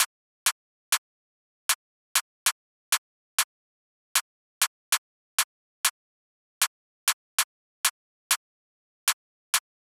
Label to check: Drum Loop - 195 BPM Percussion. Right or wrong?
Right